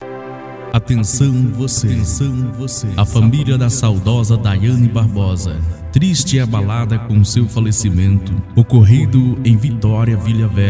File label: funeral music instrumental